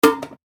S Bahn Muellklappe

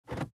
Auto Zentralverriegelung

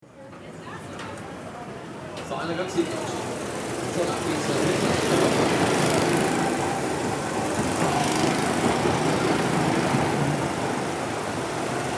Cartbahn

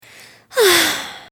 saeufzen

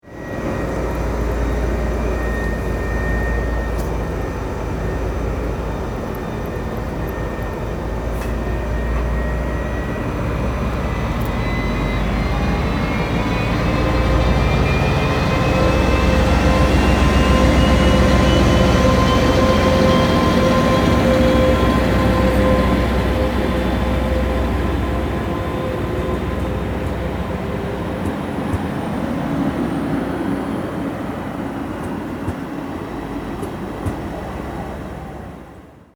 Diesellok

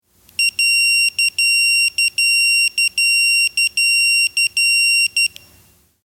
Pieper

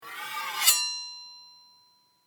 Metallklinge

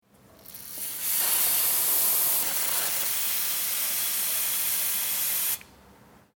Druckluft abpusten

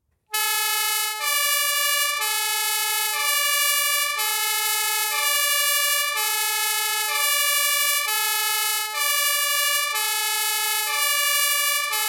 Martinshorn lang

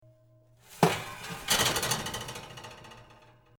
Herd Blech halb einschieben

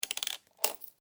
Muenzen fallen

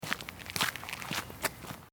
Spaziergang

